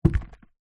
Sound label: household trash can small plastic drop or knock over